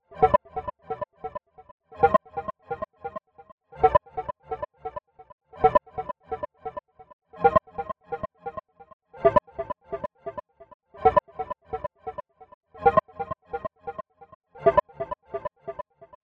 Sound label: lead techno psychodelic echo - cm; synth; instruments; loops